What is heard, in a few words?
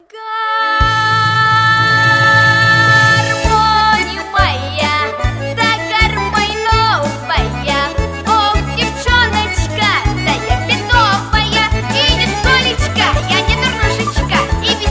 garmon